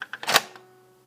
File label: close loaded, Tape recorder +2A; Mass storage; zxsp